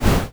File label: afterburn; garrysmod